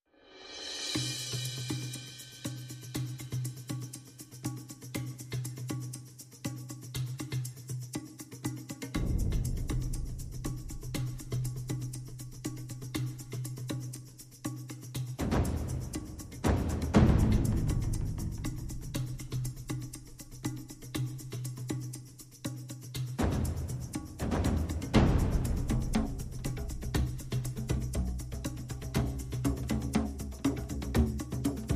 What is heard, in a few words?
Perc Only